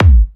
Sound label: One Shot Kick, Drum N Bass - Percussion; Sonic Foundry (Sony Creative Software); Knutrix Drum & Bass